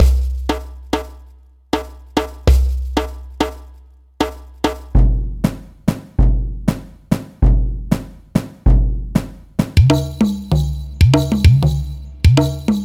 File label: SPD30 Percussion